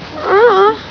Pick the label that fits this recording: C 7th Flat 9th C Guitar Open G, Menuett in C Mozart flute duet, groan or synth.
groan